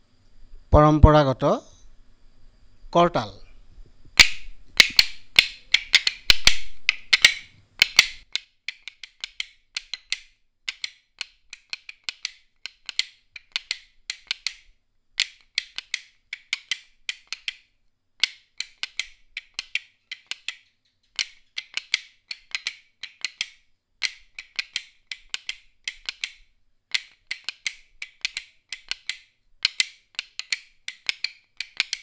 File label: Korr Taal Assamese Traditional